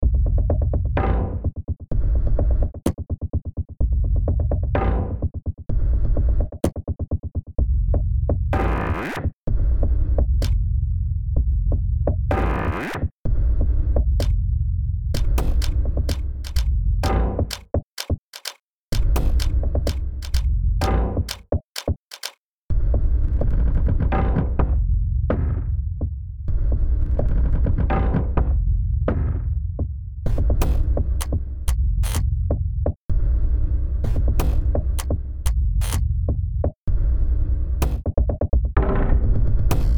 Cinematic Action Dark

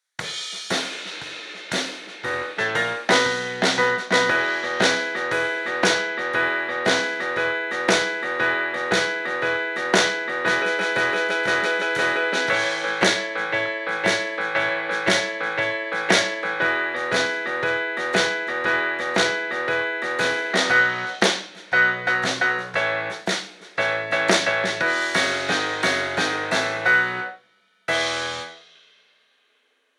E Major Blues Short mono low

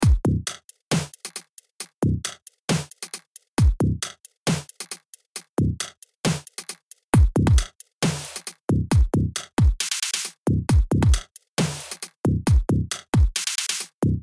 Dubstep

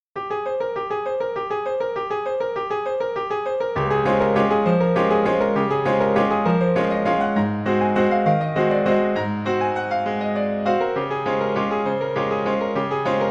Gospel Piano

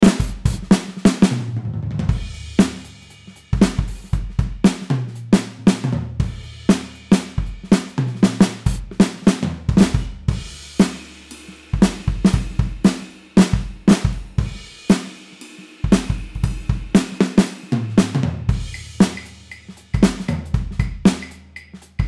Pop No Effect

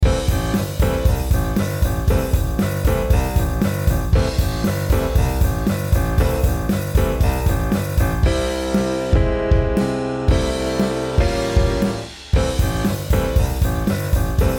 Piano Comp Test

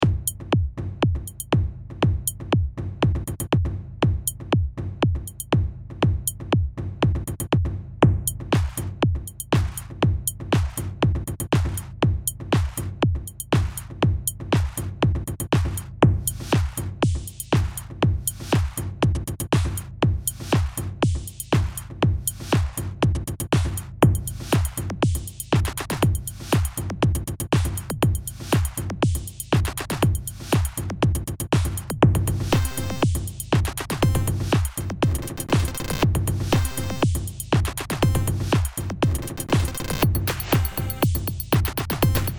Electro Eastern Nightcap

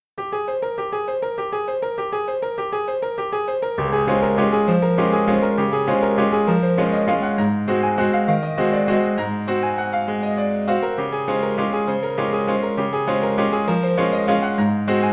Piano One Concert Grand

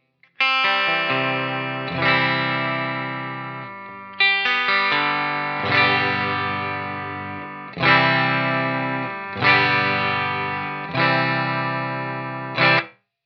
Initial Clean

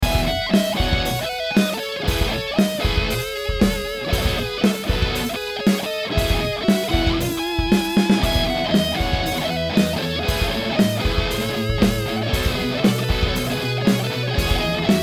Lead 4x12 Vintage

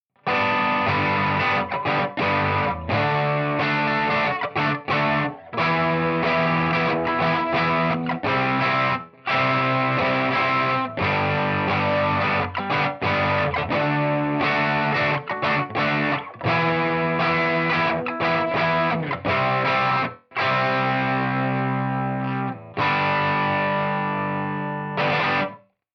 Initial Lead

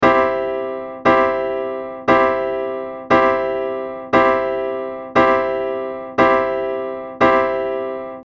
Neutron Transient Test

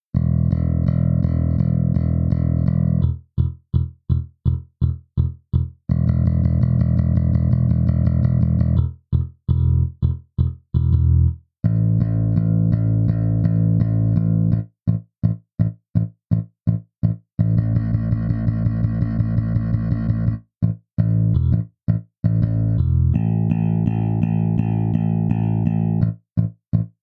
Metal bass default setting pick

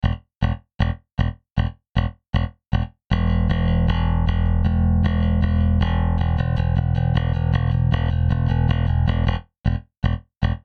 Bass DI Only; ModoBass

